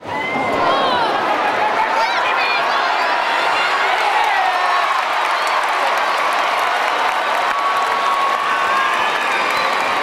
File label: Baseball Crowd Cheer; Crowds